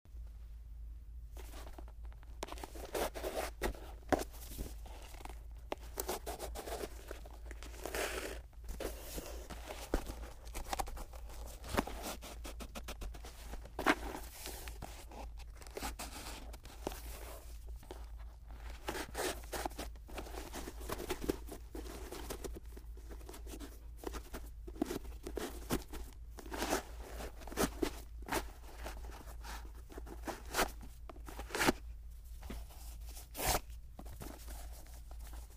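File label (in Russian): обувь надевают снимают движения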